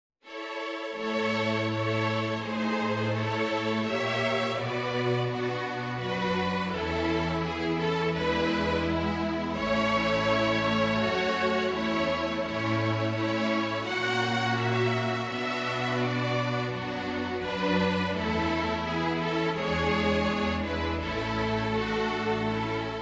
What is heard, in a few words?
String Orch; 15 Carols; o little town